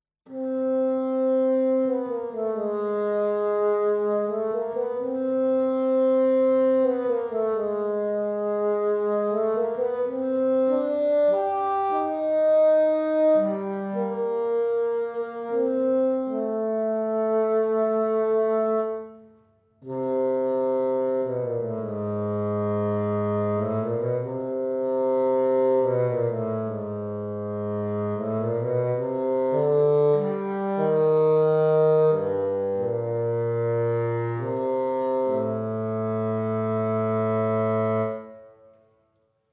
woodwind fagott solo

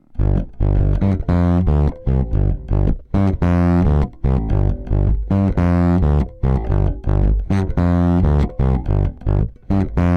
Magic Pre EBass Overdrive; Fredenstein

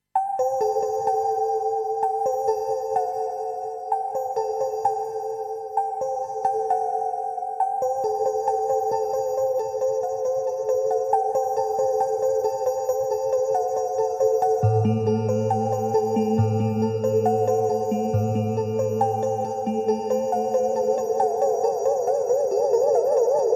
Bellistic soft

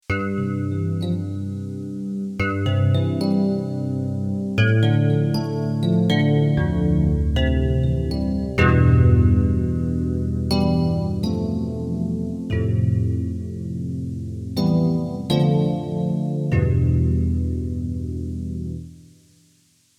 JD-800 Model Expansion

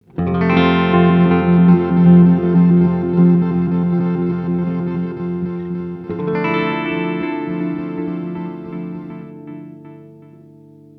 Microcosm Seq; Hologram Electronics

